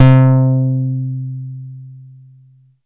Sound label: ANALOG, BASS; Best Service; Hyper Dance